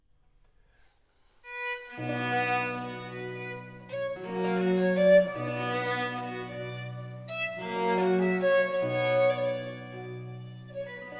Concerto For 2 Violins, Strings And Continuo In G Major, Andante; Vivaldi Concertos for Two Violins; R. 516; 2. Andante (molto)